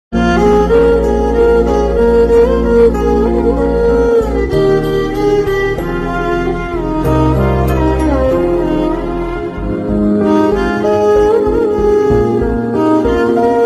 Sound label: bgm ringtone, tamil; vaaranam aayiram; teddy ringtones